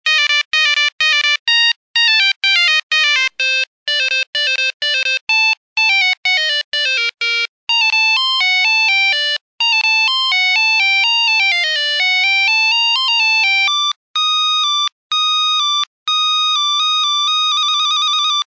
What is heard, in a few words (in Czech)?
Melodie Nokia 5110